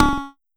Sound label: Pollard Perc, Syndrum